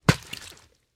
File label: egg broken